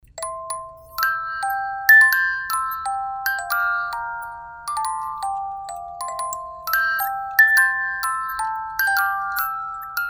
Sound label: Irish Wedding Song, musicbox